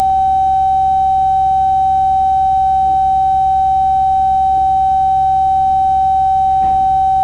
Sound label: sine